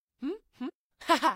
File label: upgrade Action; Talking Tom Gold Run; Becca